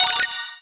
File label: Block Combo; Ui Block Combo7